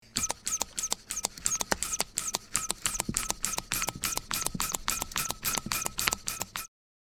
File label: Bicycle air pump